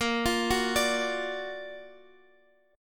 F sharp Augmented Major 7th A sharp, chord

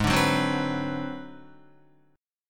Ab 7th Sharp 9th Flat 5th Guitar, chord; Ab7#9b5 strummed; JGuitar

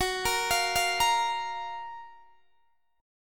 Gb Major 7th Gb down Guitar DADGAD, chord

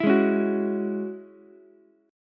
F sharp 7th Suspended 2nd, Ukulele; strummed; 9,8,9,9

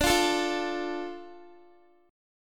D Minor D Guitar; Dm strummed

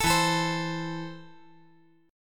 E Suspended 4th Sharp 5th

